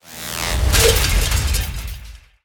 Drill Golem Death

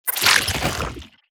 Cardinal Death

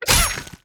Flying Chicken Attack

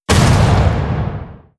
Vulcan Cannon Attack

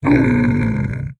DragonTurtle Death

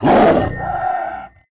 Nekomata Attack

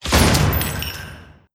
Vulcan Cannon Attack

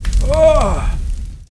Fire Lord Death

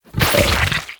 DireToad Death

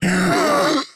Nightmare Death

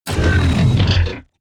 Robo Death

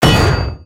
Holy Avenger Attack

